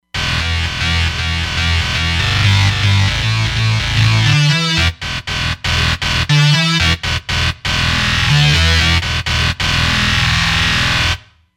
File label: Bass Drive